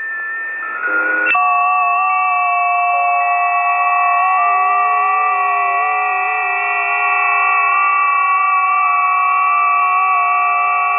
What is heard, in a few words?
20m busy, ft8